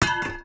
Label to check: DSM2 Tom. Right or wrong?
Wrong